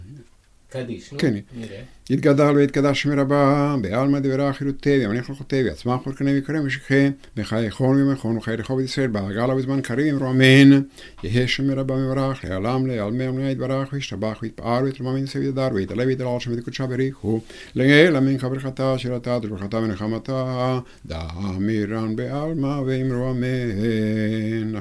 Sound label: Half kaddish; Kippur Arbit